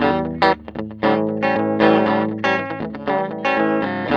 TELE7TH A#2; Spectrasonics; Hans Zimmer Guitars VOL-1; TELE7THS

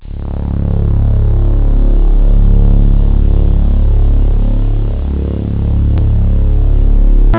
saw pad; jazz jackrabbit 2; M8

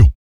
VOC PERC, Vocal Planet Jazz FX BASS PERC

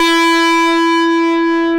DIST GT E3, GUITAR; AKAI S-Series CD-ROM Sound Library VOL-8

